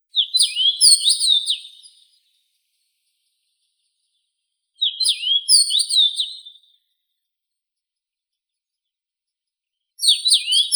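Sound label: common rosefinch, Carpodacus erythrinus; chechevitsa obiknovennaya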